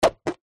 foley knife serrated stab into empty plastic milk bottle